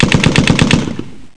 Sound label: machinegun